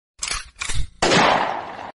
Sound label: gun shoot sound